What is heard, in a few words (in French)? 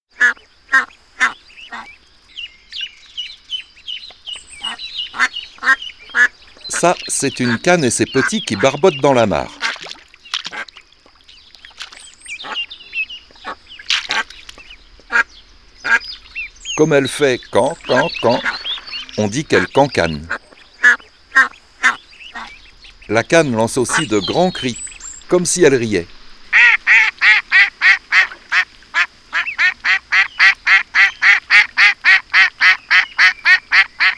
Les canards